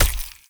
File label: Raw Meat Impact; Gameplay; Awakening Remaster